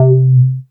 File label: BSE C, BASSES, House